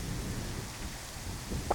mika tuo on ilm jokin lintulaji pitaa kuunnella todella tarkkaan etta huomaa

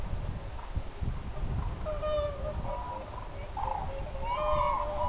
laulurastaan aanet kuuluvat kauempaa muistuttavat vahan kirvisen huomioaantelya

